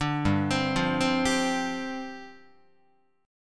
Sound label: G 7th Suspended 4th G down Guitar; Listen to G7sus4 arpeggiated; G7sus4 Chord